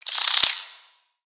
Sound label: Spider Alert; Lego; aronwk collection; ndaudio; creatures mechanical